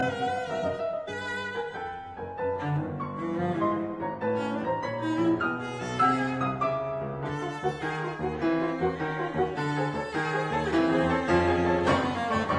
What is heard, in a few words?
Cello Sonata Sehr lebhaft; Hindemith; Op 11 No 3